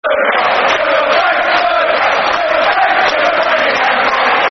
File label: Football Chants (Soccer Song); Super Frankie Lampard; Chelsea Cfc